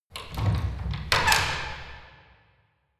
church door

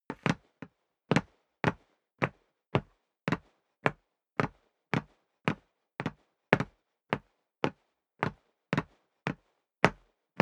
step boots wood parquet upstairs sequence

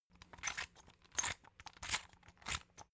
salt mill squeak sequence

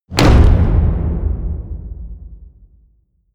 hit wood, hits impacts

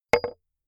metal impact wood light

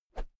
whoosh thick; swooshes-swishes

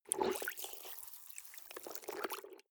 clothes water wring soft, haushalt

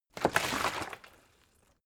bottle water sparse upend hard; gastronomie; berufe

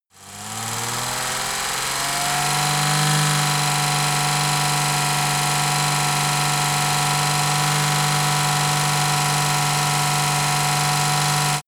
car jaguar e-type constant high engine

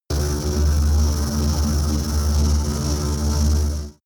extrinsic buzz, energie

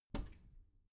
bathroom cabinet door open heavy

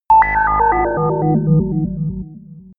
sci-fi dark planet, digital

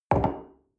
coffee place table soft

